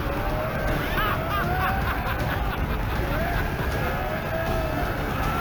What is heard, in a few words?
war sound; askeladd